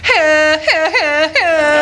air horn; taskmaster

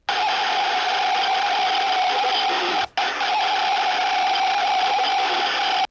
garble; fnaf 3

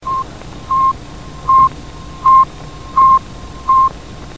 sputnik 1 beep; satellite beep; satellite beep sound effect

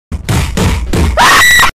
flamingo screaming earrape

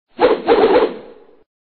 stick fighting

